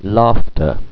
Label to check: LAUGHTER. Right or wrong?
Right